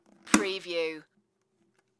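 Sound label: Plastic Lid Open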